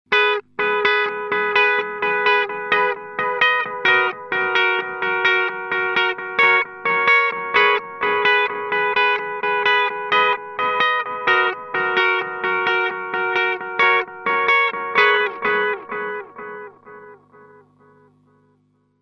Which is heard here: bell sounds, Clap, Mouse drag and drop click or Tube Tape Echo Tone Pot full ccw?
Tube Tape Echo Tone Pot full ccw